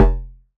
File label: BAS F1, Yamaha TX81Z synth bass